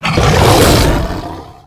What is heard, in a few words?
Attack; Salhets Prideleader